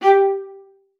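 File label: G5, viola spiccato; Xsample VOL-5; 90 s Sample CDs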